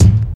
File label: focused kickdrum sound g sharp key, kickdrum sound tuned to the G# note